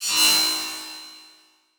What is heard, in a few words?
Multimedia Internet CD-Rom Flash Ding